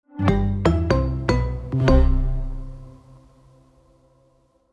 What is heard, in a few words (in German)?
Audiologo Ohne Stimme ohne Glocke Bass; Hoerpartner; Galoma